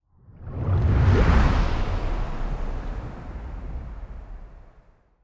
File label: colony ship move; aquatics